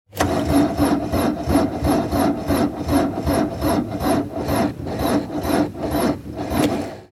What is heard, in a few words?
Car startup fail